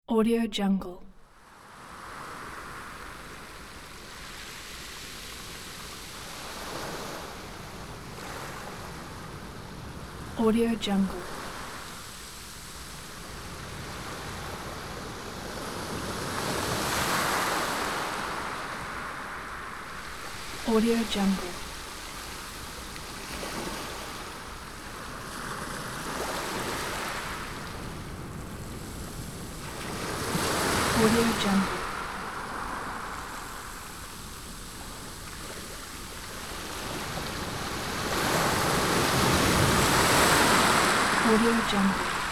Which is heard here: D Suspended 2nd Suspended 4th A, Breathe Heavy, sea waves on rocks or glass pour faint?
sea waves on rocks